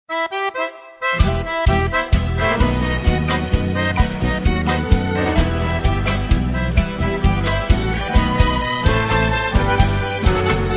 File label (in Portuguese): sertanejo classico